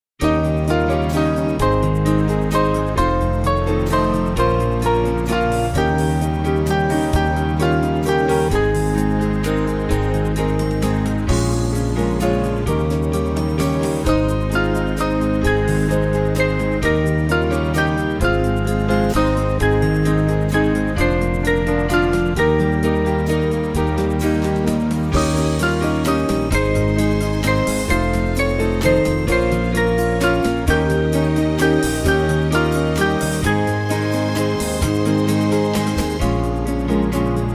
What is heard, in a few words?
Mighty Lord Jesus, Band; Bullinger